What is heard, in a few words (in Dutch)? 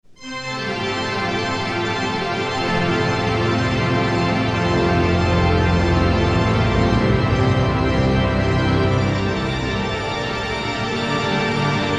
Orgelsolo